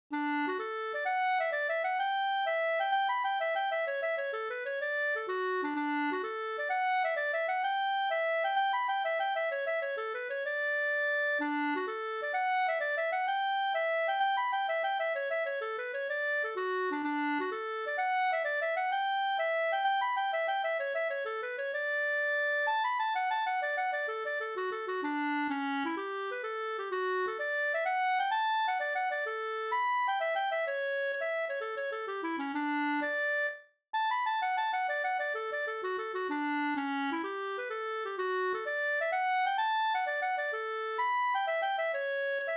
polska; e Johannes Kihlstrom Tveta Vr

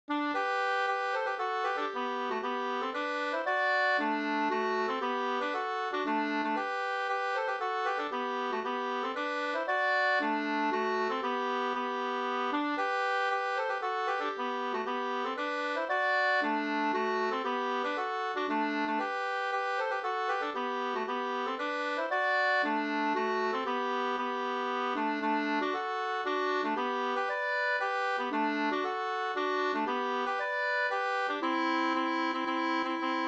polska Gdur

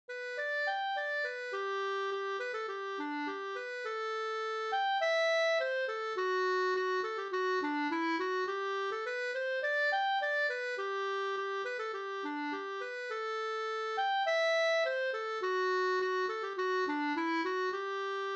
snoa; fr Norrland

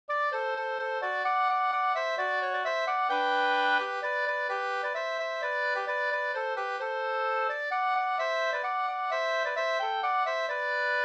folkdans, Tjeckisk polka Gdur; Sternpolka

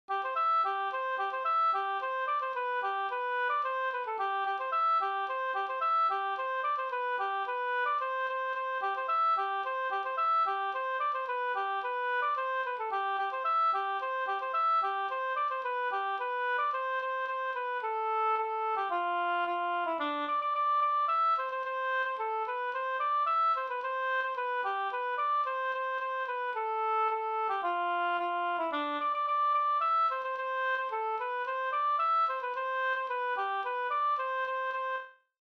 folkdans, Tretur no Cdur; Strandvik

